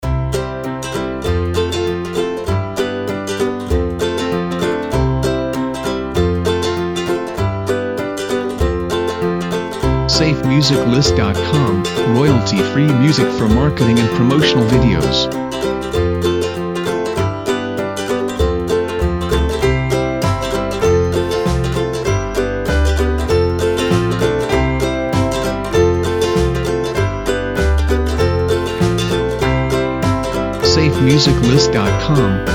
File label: Inspired By Ukulele; SafeMusicList